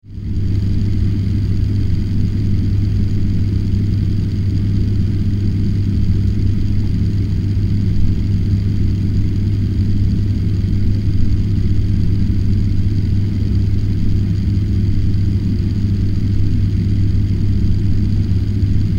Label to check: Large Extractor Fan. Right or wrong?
Right